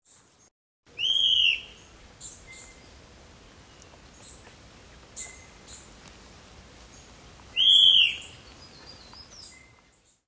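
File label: Mackenziaena, bird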